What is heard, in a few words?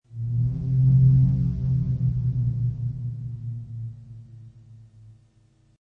Moaning Synth Pad, Moaning creature or ghost. Deep, distant sig; Moaning creature or ghost. Deep, distant sigh from spirit or elemental being. Dark echo synth pad soun; Scary Soundscape Sound Effects